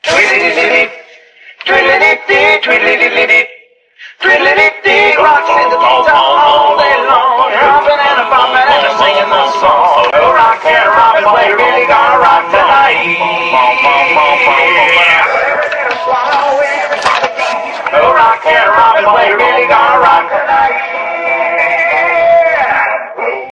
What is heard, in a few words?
Ring Tone; Andy Bernard; Cleaned Up in Logic Pro